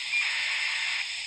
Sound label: ACARS